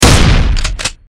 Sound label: Pump Shotgun Fire; Invector 3rd Person Controller; Shooter; Weapons